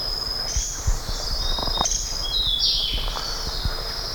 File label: sunda woodpecker